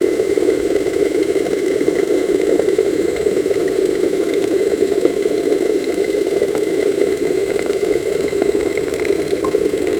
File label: kitchen